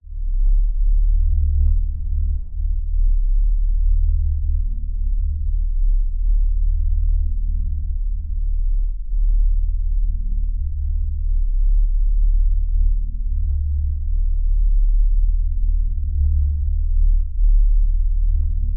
science fiction force field lfe sub bass drone pulsating; zapsplat